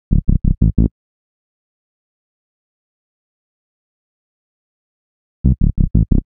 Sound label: Synth Bass